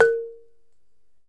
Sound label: BALAFON